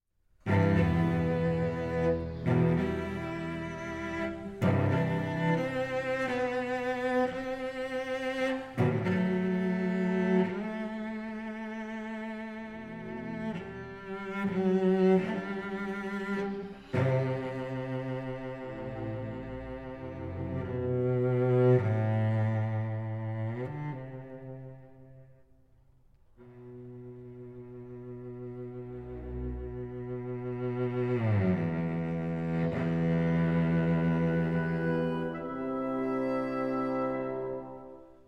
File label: Cello Concerto in E Minor, Adagio - Moderato